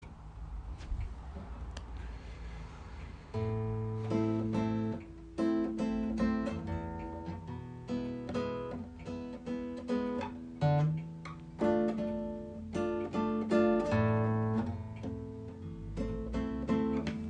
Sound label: Rhythm, guitar